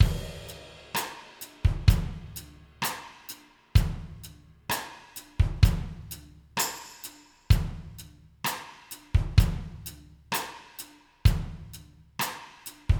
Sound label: drums para comp amb verb